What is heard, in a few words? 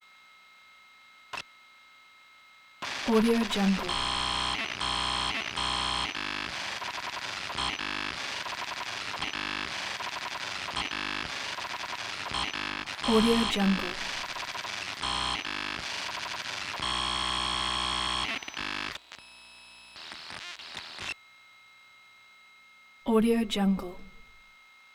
beeps squawks static sporadic buzzy bursts; prosoundfx